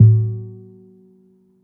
Classical Guitar Marimba Hit, Classical Guitar Extended Technique